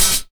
sharp open high hat f key

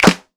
hand clap f key; percussion; single hits one shots

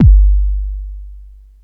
smooth bottom end kick drum sample g key

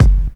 raw bass drum sample f sharp key; kick drum samples

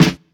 short snare drum sound f key

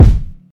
rich lows bass drum single hit f key; kick drum samples

